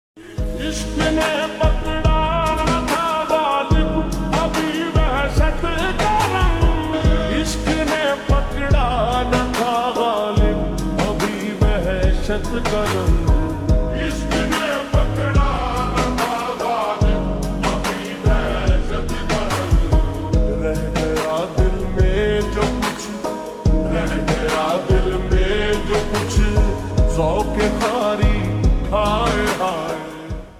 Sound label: Ringtone Slow Reverb